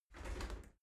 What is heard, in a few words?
shower slide door slide short close